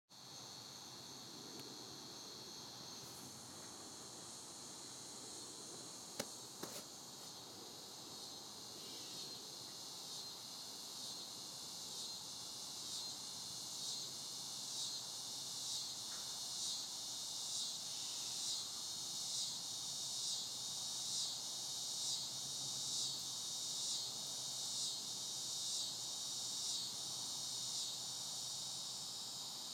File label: a cicada with a very odd song